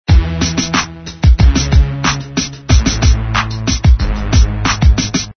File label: loop